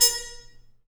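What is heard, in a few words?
brass trumpet mute stab, Harmon stabs; Harmon Amb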